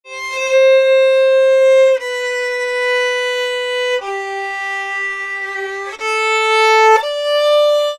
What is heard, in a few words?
Fiddle, Appalachian; Continental Drift Aftershocks